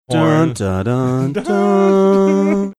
singing somber music bumper

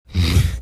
snorting

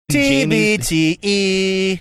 singing

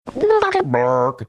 upchuck

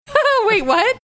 laughing saying wait what

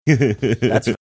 cute chuckle

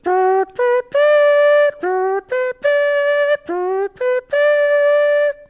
taps mouth horn

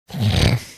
snorting

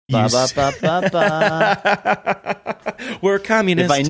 singing jingle; mcdonalds jingle were communists

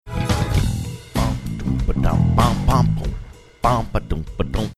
singing along funky bass; tim heideckers

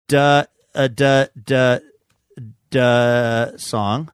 duh uh duh duh duh song

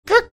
imitating push to talk sound; nextel

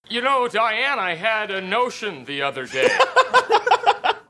laughing had a notion the other day

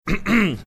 clearing throat

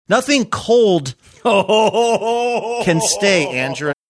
laughing saying nothing cold can stay

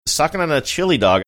sucking down chili dog

